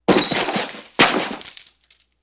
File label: Body Fall Glass Debri